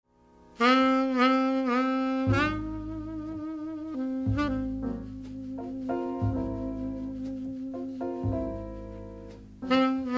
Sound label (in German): Saxophon